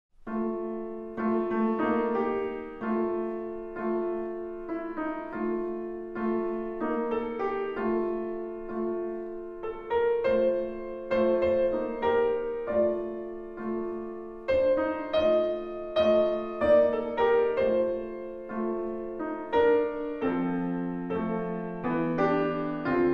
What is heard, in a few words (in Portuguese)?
Piano Miniatura; Encontros com a Musica de Sao Paulo